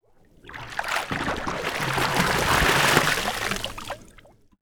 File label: Water; TOOLKIT vol.2